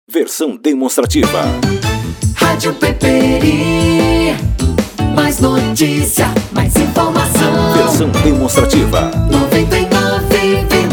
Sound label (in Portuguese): vinhetas radio; peperi